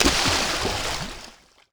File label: water land slow medium